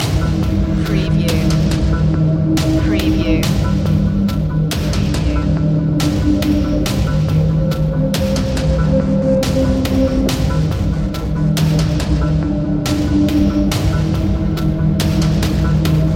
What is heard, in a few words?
calming storm